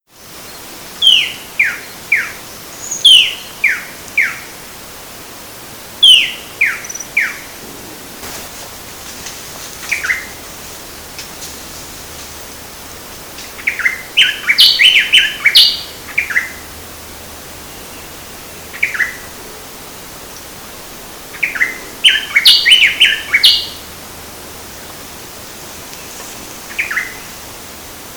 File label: Thryothorus longirostris 3 birds; Bertioga; SP; Brazil; Silveira